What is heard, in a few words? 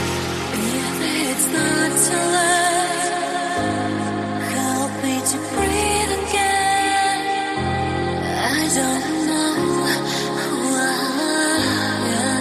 new mix ringtone; iphone se